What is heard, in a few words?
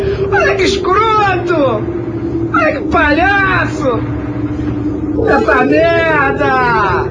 suicide